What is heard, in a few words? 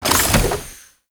Zariman Door Small Close; Ambience; Gameplay; Warframe; TheNewWar